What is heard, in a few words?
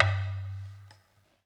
djembe; eddyflux